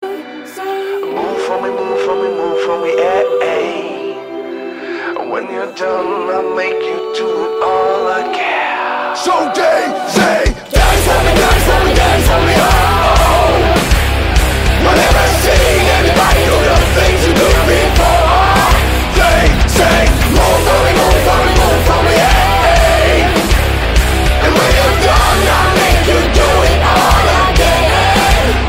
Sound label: Dance metal